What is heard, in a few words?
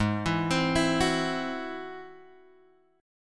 G sharp Augmented 7th G sharp; G#+7 Chord {4 X 2 5 5 2} arpeggiated